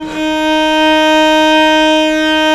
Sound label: VL D#3, VIOLE, Historical Instruments; USB Soundscan vol.23